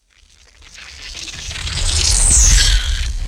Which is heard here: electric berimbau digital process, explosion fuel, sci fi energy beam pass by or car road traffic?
sci fi energy beam pass by